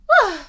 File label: "uh"; peach; SM64 CO-OP